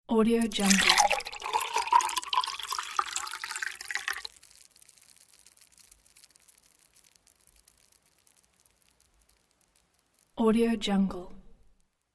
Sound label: pour soda; fastfx